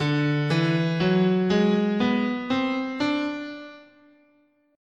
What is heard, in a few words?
D Prometheus scale